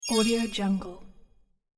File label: interface infographics arp bells down; interface sounds